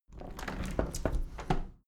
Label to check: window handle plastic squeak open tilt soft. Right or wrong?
Right